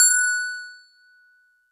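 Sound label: StarPiano DX100 F#2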